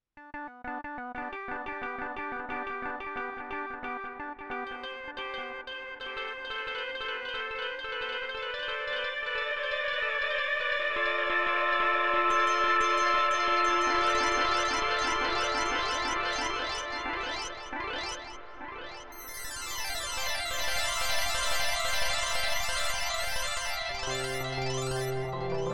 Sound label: VTAPE Delay